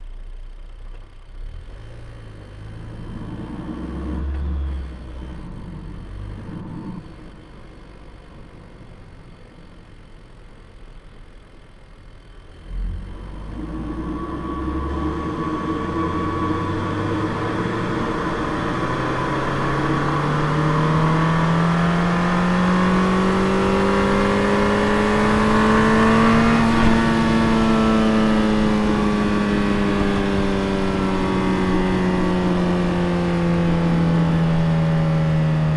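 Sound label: ford focus rs onboard drive ramps stop off engine; mkh8020; Sonniss GDC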